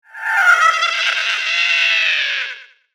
Evil Clown Laugh

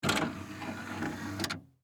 Dvd Player Open

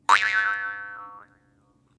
Boing

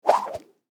Cable Whip Whoosh

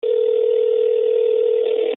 CellCallRing

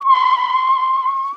Car Screeching to a Stop

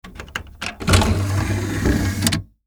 Dvd Player

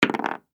Dice Roll

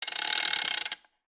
Chain Retract

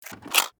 Compartment Door

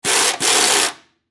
AirGunDrill

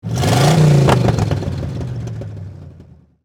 Car Engine Rev

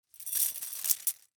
Chain Movement

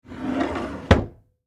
Drawer Close

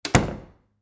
Door Basement

